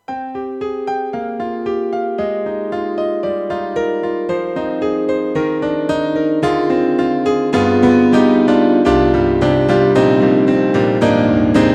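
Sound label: YAMAHA MU500 Grand Piano; GM2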